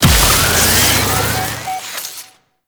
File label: Excavation Gas Deliver; Warframe; Nineteeen Ninety Nine; PvPvE